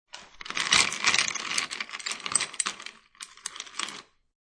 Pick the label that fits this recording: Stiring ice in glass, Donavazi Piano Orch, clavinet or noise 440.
Stiring ice in glass